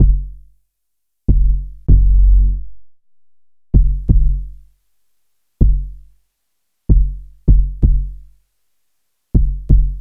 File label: Bass High Quantity